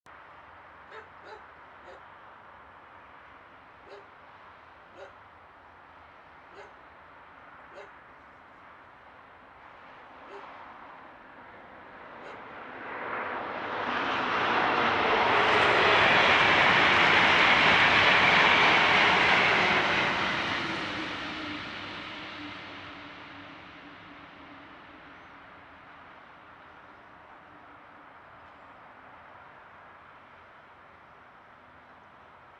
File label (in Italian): Treno mono; Eigenmike; A-weighted; Nuova Strada